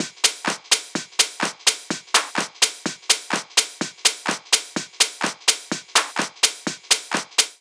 126 Tops

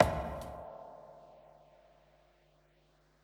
Broken Tom

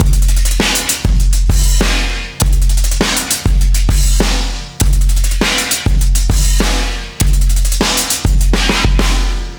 Resampled Breaks

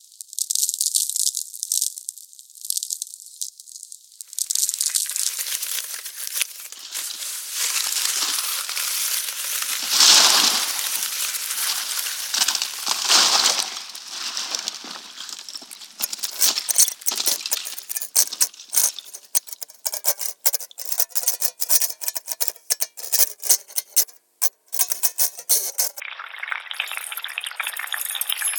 Twisted ASMR; Noiiz

